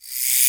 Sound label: REV, SOUND EFFECT REVERSE